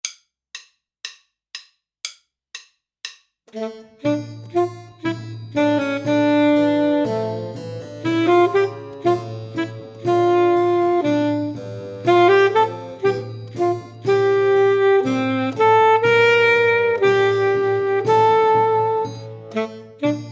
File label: Full Sax